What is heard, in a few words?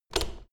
door plastic handle pull up hard